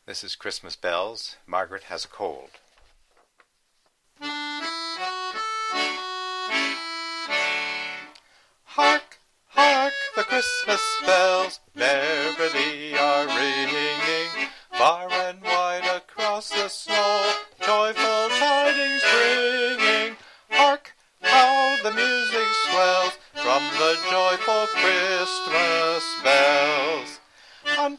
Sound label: Christmas Bells singing, cherub choir